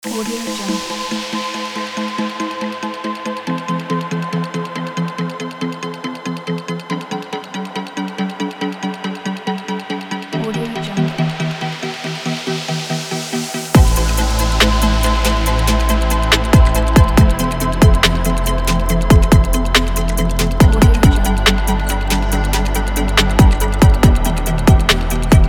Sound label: technology background nature ambient